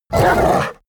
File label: hellhound whimper pain; schreie